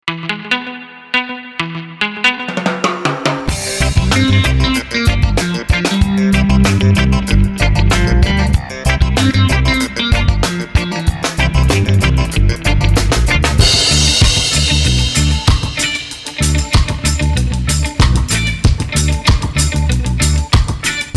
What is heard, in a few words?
reggae